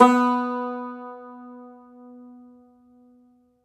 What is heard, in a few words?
Cumbus SAZ B, Plucked Strings; E-MU Producer Series Vol. 7 – Old World Instruments